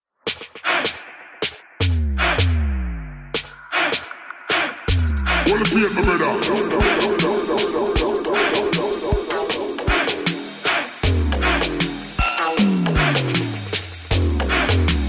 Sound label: stabber bass